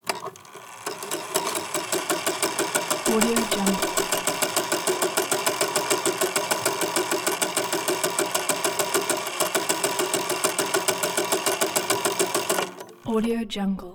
mechanism windup; soundmorph

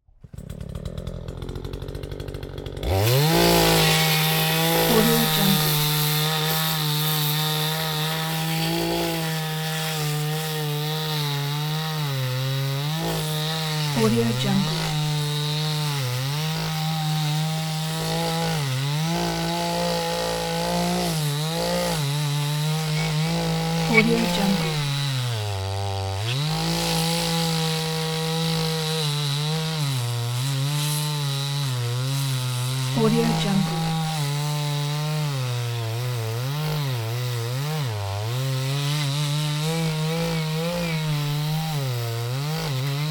chainsaw

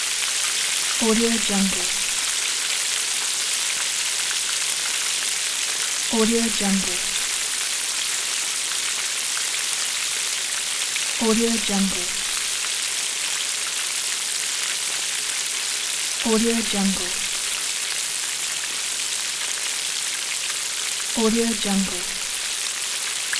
deep frying food in a kitchen

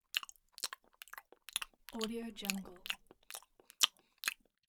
chewing

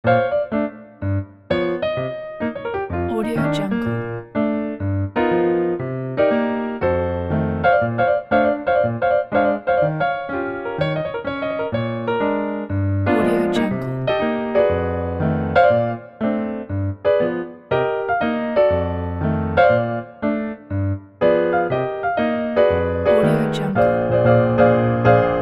jazz piano hero

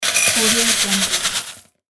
cereal pouring

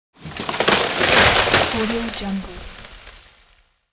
falling tree; pure-noise

